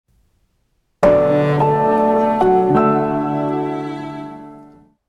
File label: Harmonious; Samsung; The Voyage variants